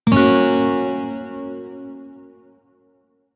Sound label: Shawzin Hirajoshi Chord H, Music; DE; Warframe